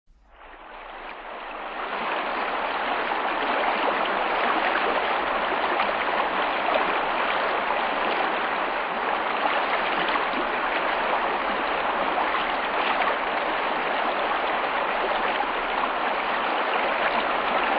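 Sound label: mountain stream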